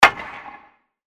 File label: stick hits glass